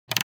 Radio volume control knob handling

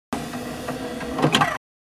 DVD player tray open or close

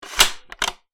Rifle bolt cycle; assault rifle

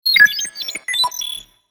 Futuristic data calculations short

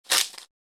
Salt grinder twist crunch; cooking

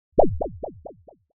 Cartoon bounce; boing

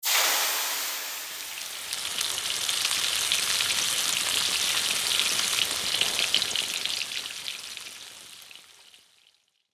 Pan frying slow cooking; household

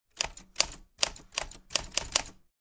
Smith Corona 1940 vintage typewriter typing

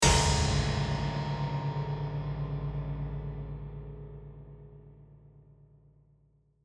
Horror piano chord crash piano lid haunted

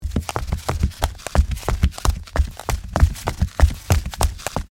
Flip flops run on wooden floor

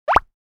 Cartoon idea pop

